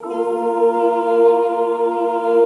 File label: PAD